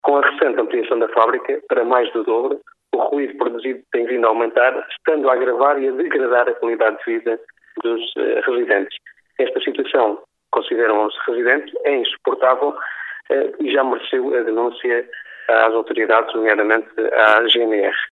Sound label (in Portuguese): ruido